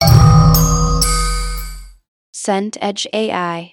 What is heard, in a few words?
gong large strike